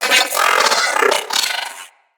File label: Riot Moa Vocal Attack